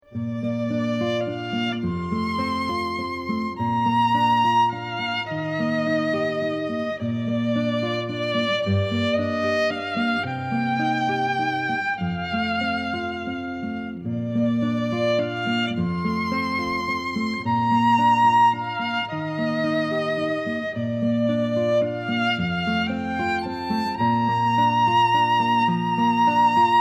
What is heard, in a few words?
violin and guitar duet